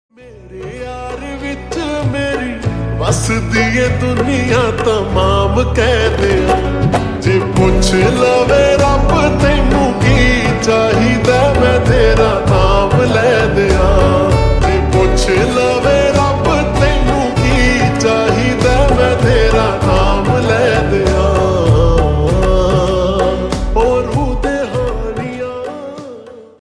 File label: Slowed Reverb Ringtone